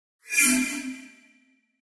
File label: Mouse Over Elect, mouse over